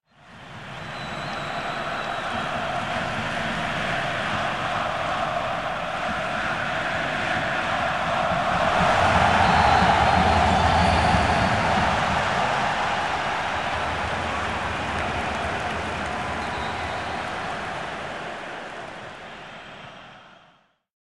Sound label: Crowd Cheer Rising Audience Reaction Very Large Sports Stadium Crowd; Hollywood Edge; sound designer's toolkit v2